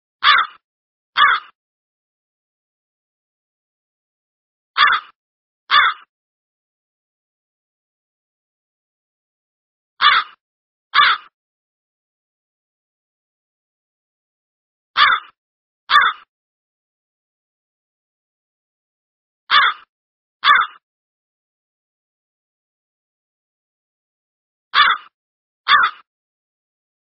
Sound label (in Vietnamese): Tieng Qua keu troll